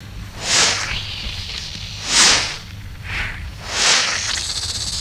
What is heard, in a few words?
fishing rod casting with vinyl crackle; sports